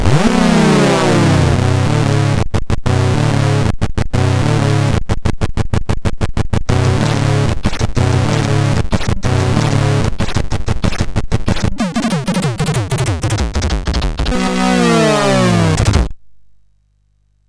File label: sid MOS6581R2